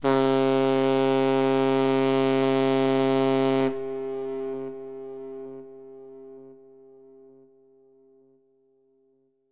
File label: ship big whistle